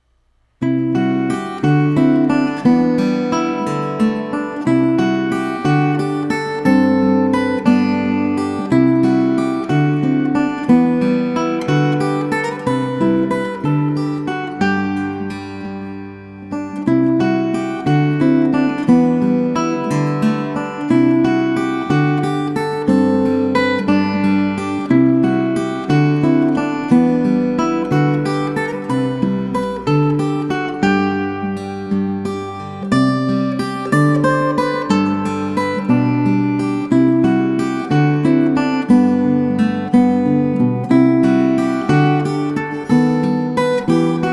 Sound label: mix piezo